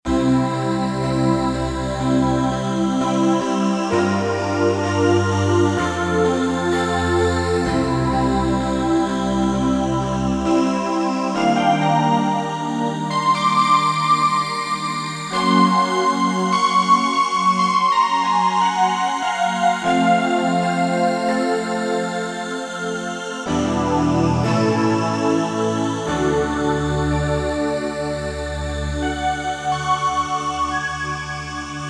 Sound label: Air Heaven, Roland SC-880